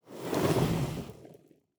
rain Of Fire single; tenshi

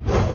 GUI Transition Open

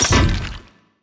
basket break; sfx

